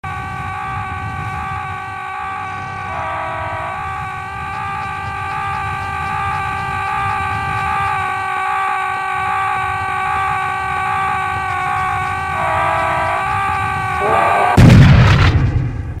aaaa

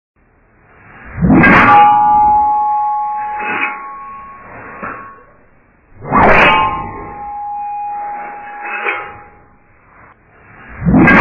sword; vapesquared

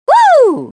woo

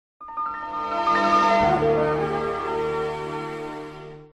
computer on fire

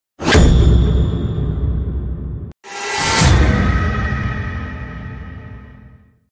parry

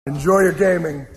rock gaming

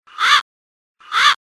crow, reverse

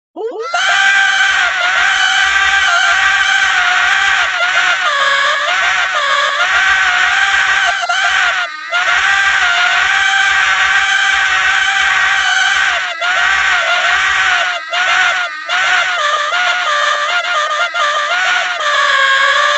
scream; mario

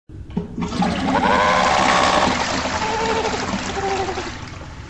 flushed

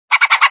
bark bark bark

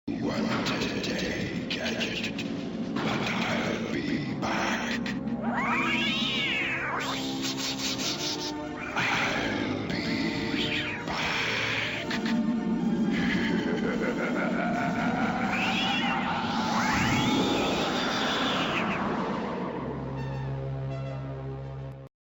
claw; cave

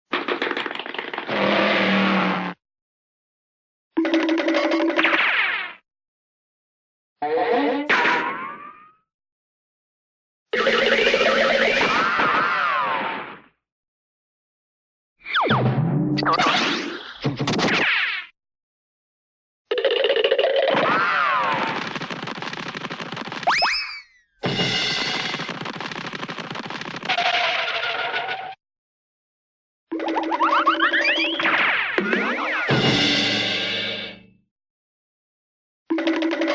sound effects; cave

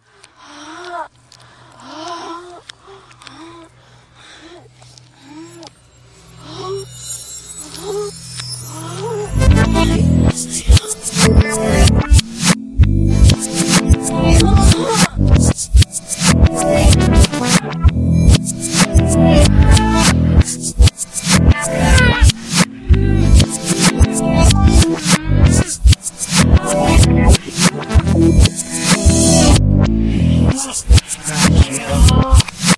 groove; reverse

